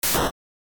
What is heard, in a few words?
click; PPdastr Studio